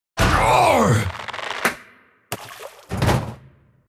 ambient port; World Of Warships; port3d

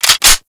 wp foley usp reload chamber; Call of Duty Modern Warfare

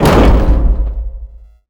sheetmetal land

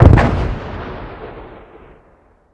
explosion 40mm distant impact; Explosions

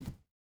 footstep hide wood jump start; Rust

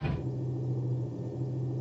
Aircrafts Gear Hydro Tail Loop; DCS

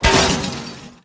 metal lamppost down; World of Tanks Blitz

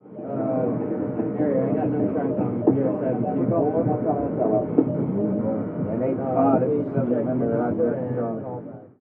ambient battle; World Of Warships

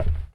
wood walk

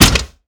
Aircrafts Engines Packard Merlin Prop; DCS

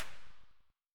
akm distant loop silenced tail; Escape from Tarkov

